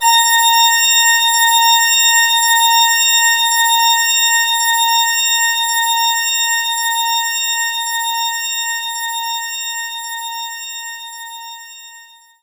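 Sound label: Pipe Organ G#2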